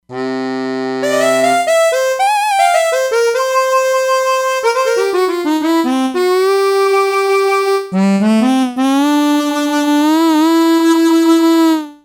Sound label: Mod Harmonica, E-mu Proteus-3; Factory patches